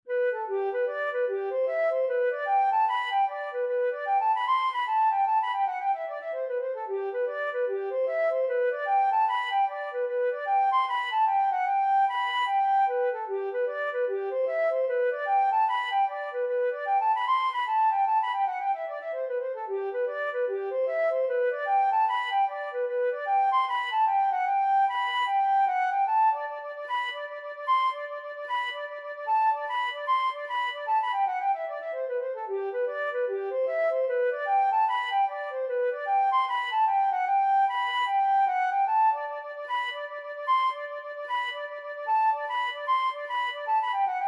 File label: flute